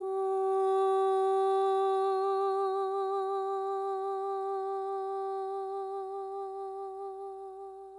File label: woman uuuuh